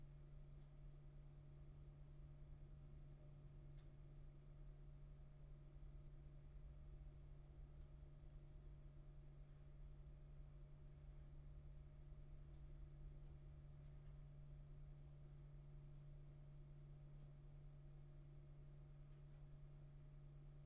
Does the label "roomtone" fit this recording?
Yes